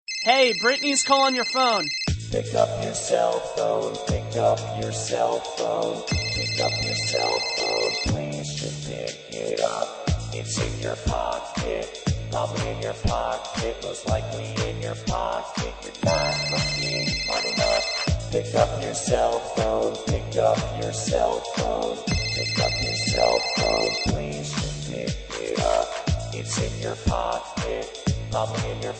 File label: Is Calling Rap, ringtones